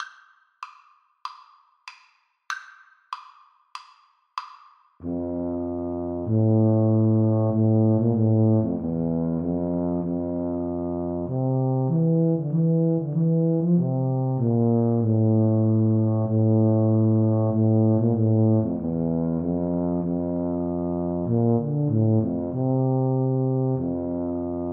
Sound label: Tuba Duet